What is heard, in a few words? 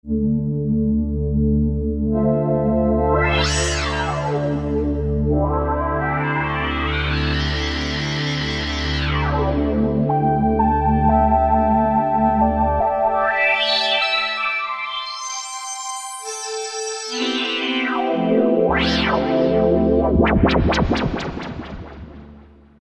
Strings; Cheetah MS6